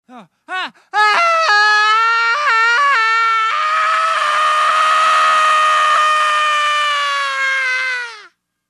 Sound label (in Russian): vopli sumasshedshego; Звуки сумасшедшего; идиотские звуки, которые издают психи в фильмах страшилках